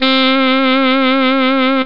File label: Alto Sax; OctaMED SoundStudio V1; jazzies samples